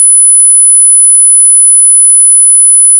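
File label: high pitch; high frequency sound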